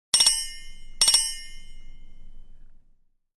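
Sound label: original ringtones and notifications Nokia Asha 302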